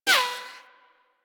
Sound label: Bullet Impact Ricochet; Warframe; Weapons